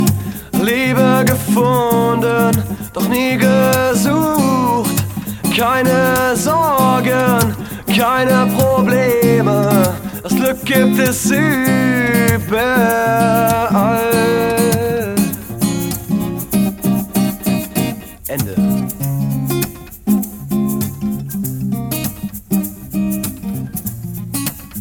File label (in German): 6 Saiten